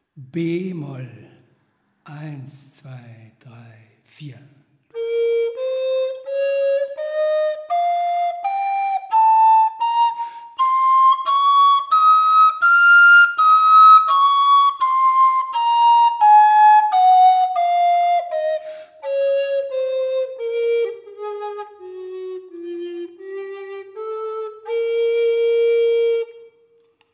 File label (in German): Altfloeten tonleitern